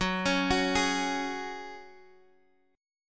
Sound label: Gb Suspended 2nd, Guitar Half Step Down; Gbsus2